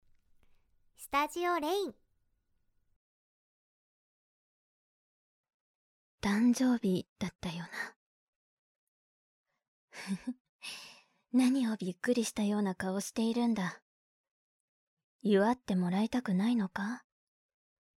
witch's breath; Sleep asleep with the witch's breath

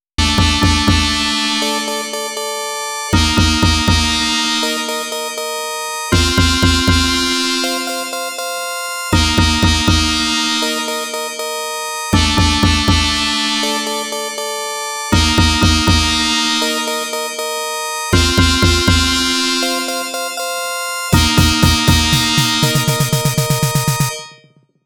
BGM gameover, 8bit; オクターブガールズ～チップチューン箱守八千代～

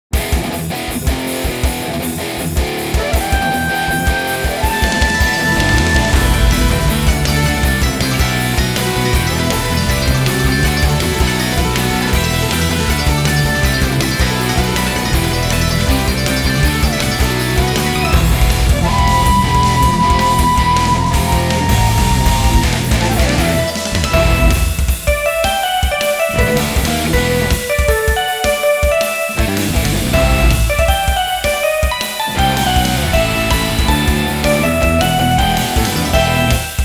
Instrumental

